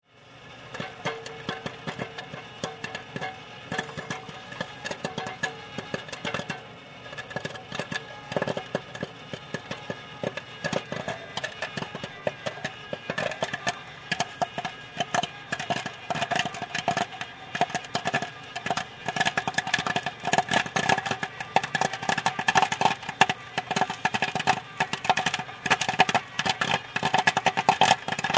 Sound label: Popcorn machine